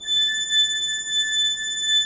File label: 3D Organs Pipe Mix Celeste